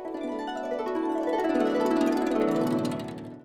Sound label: spin; Amatic; Chinese Spider